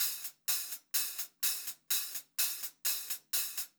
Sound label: Pop-Rock Drumloops; USB Soundscan vol.37; AKAI